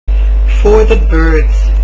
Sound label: birds